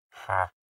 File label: villager haggle